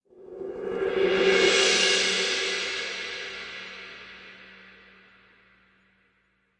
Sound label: Light Cymbal; Brand X Music; Brand X Music Catalogue - Editor's Essentials